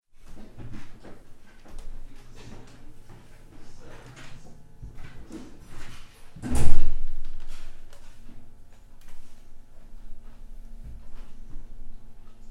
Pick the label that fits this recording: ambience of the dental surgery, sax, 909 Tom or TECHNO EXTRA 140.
ambience of the dental surgery